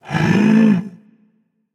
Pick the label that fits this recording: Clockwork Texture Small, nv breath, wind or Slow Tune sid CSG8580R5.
nv breath